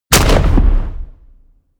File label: shot